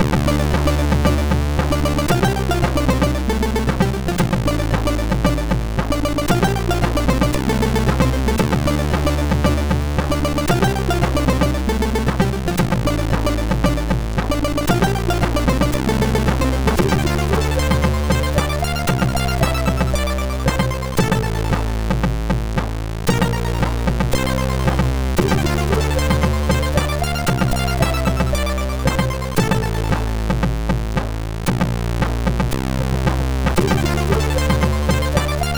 Driven intro, MOS6581R4

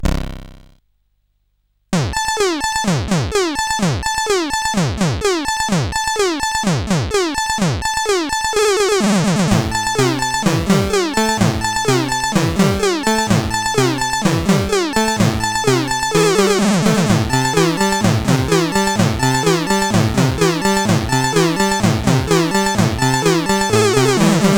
Funky Song, sid CSG8580R5

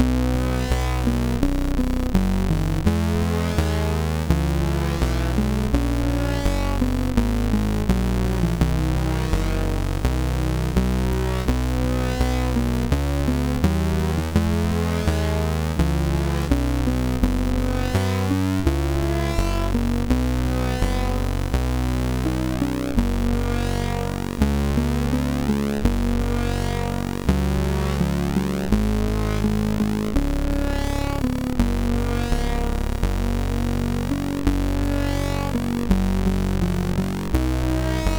Internationale, sid MOS6581R4; hvsc

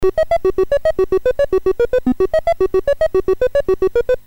Music Game BASIC, sid MOS6581R4; hvsc

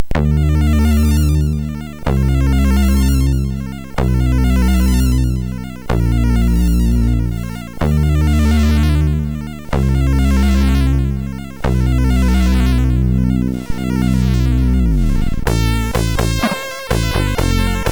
end, sid MOS6581R4

